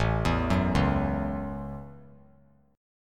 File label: A 6th; arpeggiated